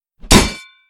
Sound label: KNIFE DROP; don t be afraid the first toy